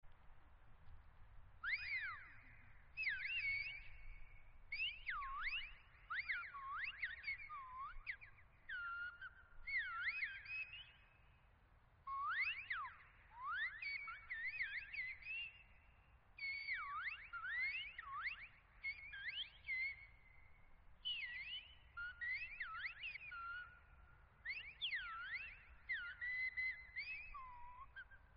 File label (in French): conversation sifflée